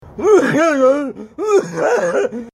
cough; reaper

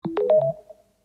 samsung super fast charging

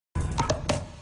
magnum crack

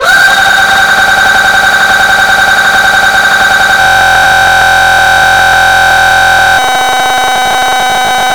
aaaaa glitch

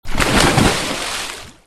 goosh

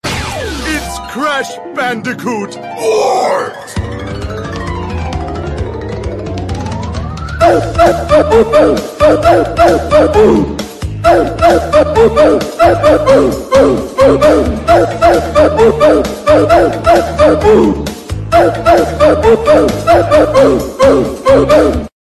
oof

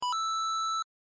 coin tester

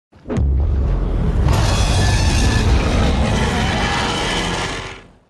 roar final stage; fatalis

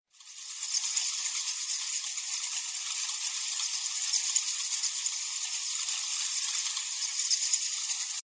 bat sound effect

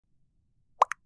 chat sound; among us

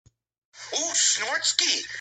snortski

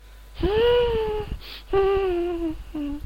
hmmm

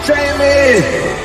jingle

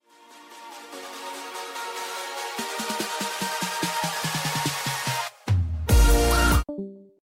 discord left sound

